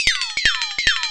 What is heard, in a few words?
FLEXATONE